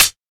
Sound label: muffled hi hat A key